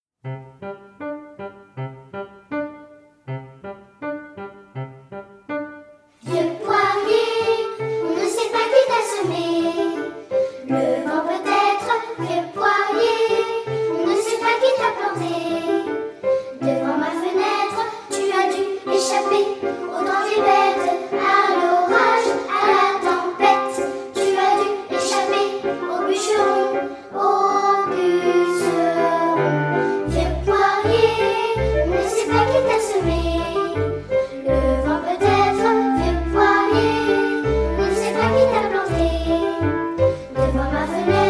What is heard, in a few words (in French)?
Do Majeur; vieux poirier